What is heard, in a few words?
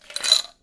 ice into glass; eating